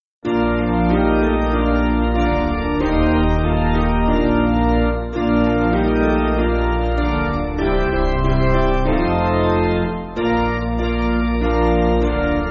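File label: Piano, church backing; Basic Piano & Organ (CM)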